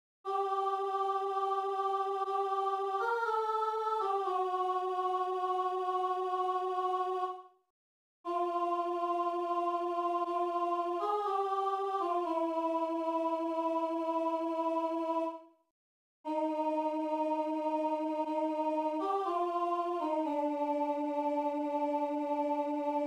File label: stabat mater alti